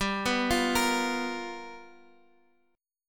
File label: G 7th Sharp 9th G chord, Guitar Half Step Down